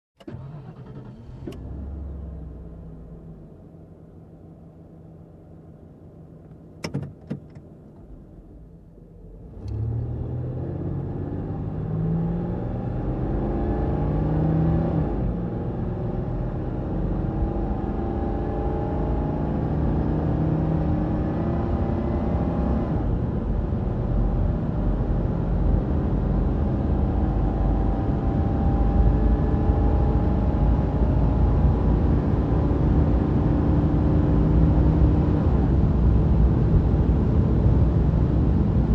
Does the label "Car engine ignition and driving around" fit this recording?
Yes